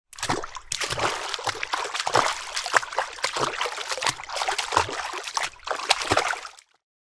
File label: human footsteps water; sound effects one